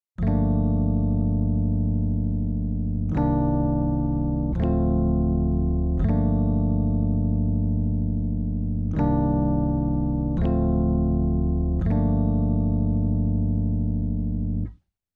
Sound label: Chords; Convert To Pad; Factory Expansion Pack